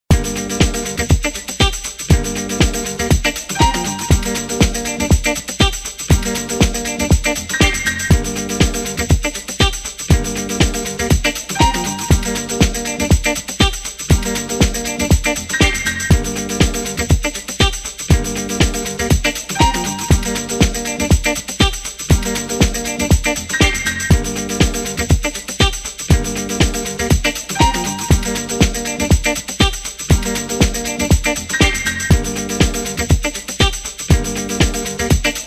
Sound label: 70s disco dub